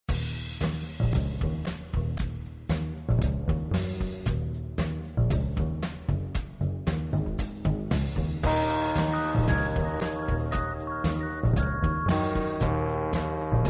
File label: Shop Music; Into Space 2